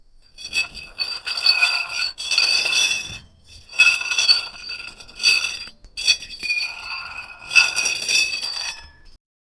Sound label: sound of a long and, rod